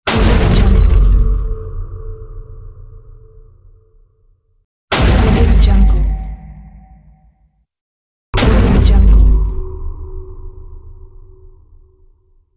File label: explosion hits